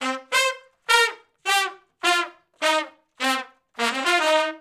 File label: R&B Riff (C) unison, Horncraft; Sections